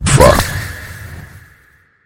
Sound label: magician animal curse transform; kitty0706 sinclair sounds